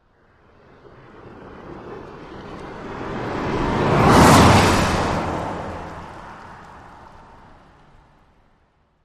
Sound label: Road Sweep